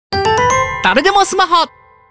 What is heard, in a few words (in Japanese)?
誰でもスマホ サウンドロゴ 男性 ピアノ＋鉄琴; FIX音源; sound logo